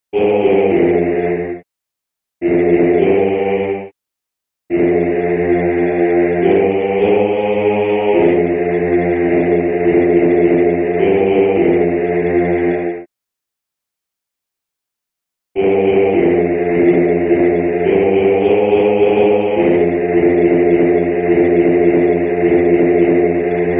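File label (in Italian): bassi, Coro musica afroamericana, spirituals; I Got a Home in Dat Rock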